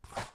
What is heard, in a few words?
Voodoo Pin; Phasmophobia